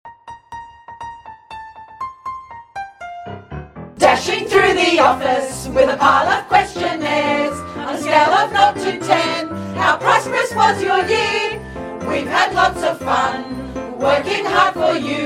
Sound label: Christmas Card Jingle With Backing